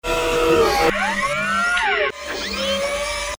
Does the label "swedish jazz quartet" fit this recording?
No